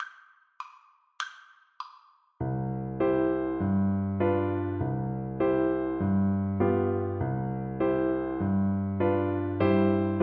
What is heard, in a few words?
keelrow FL kar, Flute